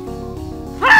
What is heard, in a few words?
aughh; vsauce